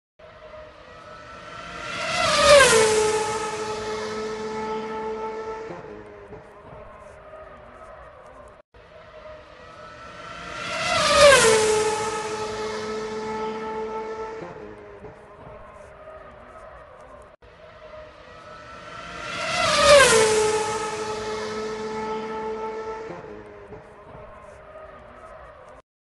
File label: Formula 1 Car